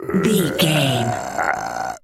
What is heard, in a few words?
zombie voice groan